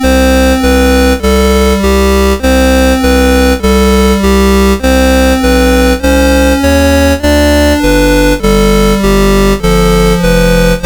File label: Miow Miow; CSG8580R5